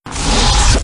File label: saber off; kylo